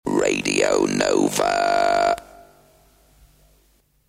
nova Electronic, Jingles

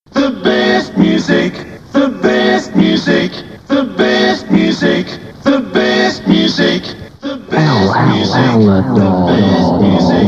Jingles

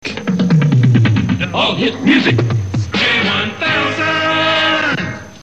Jingles

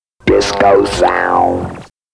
jingles, disco sound

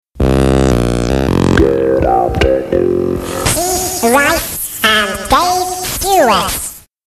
Jingles

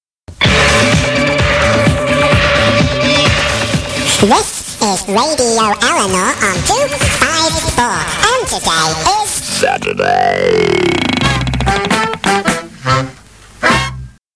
Jingles

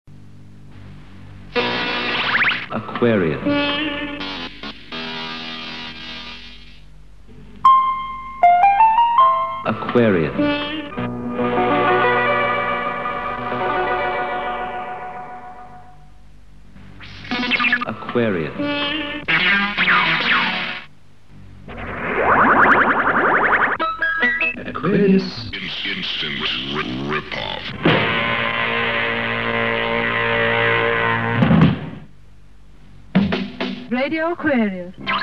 Radio Jingles; Radio Aquarius; Mersey Pirates

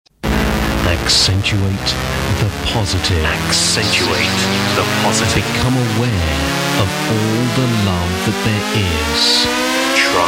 jingle; Caroline

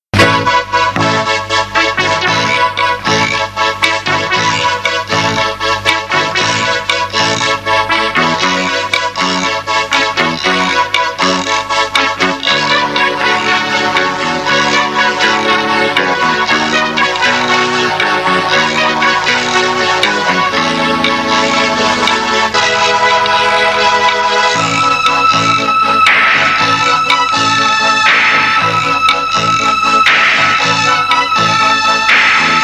Jingles - Instrumental

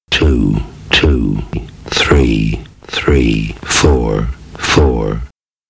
Jingles

